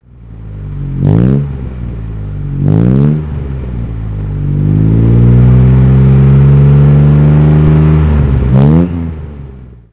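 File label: xk150 throttle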